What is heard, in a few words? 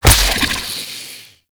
Infested Should Fire, Enemies; Warframe; Femur